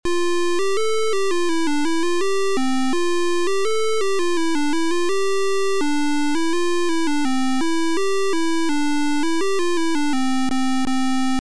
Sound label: MELODY